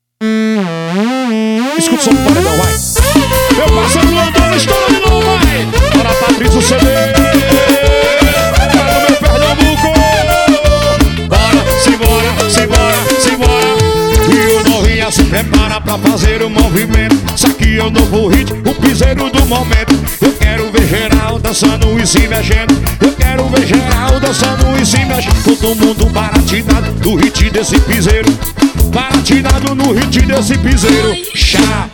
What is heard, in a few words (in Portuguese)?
piseiro e passinho